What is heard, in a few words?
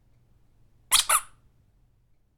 Whip Jouet